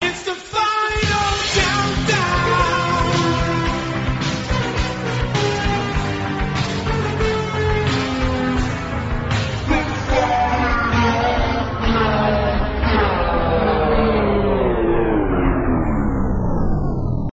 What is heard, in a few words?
battery off